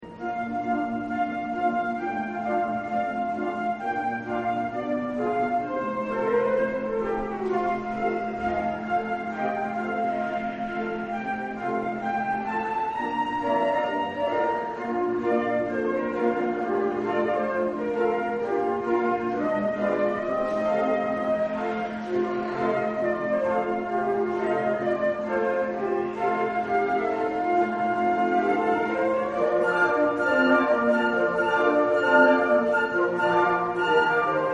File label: concert, flute